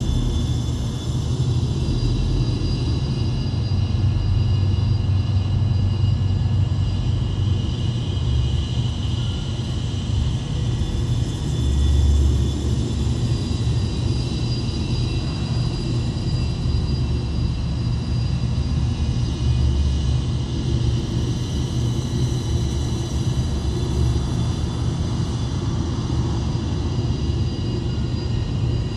Dojo Ice, ambience; Ninjago 2D

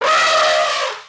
Pets Elephant Happy; creatures; Lego

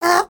Trex Hrm Snorty; creatures organic

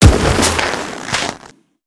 Snowman Smash; destructibles

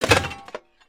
Binoculars Smash; destructibles

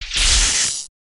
terminal shock

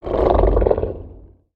Fire Breath Growl; creatures organic

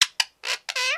parrot chatter; creatures organic

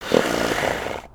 horse idle; creatures organic

